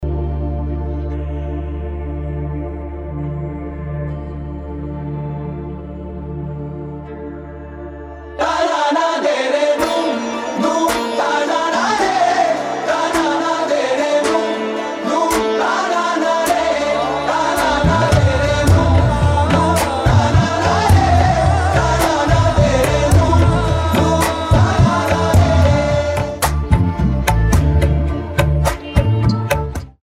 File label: Karaoke Song